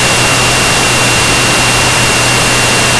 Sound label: ENGINE